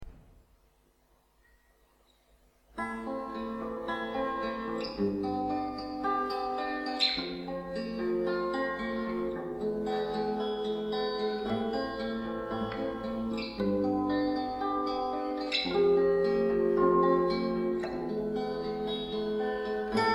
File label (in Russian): U ognya svechi instrumental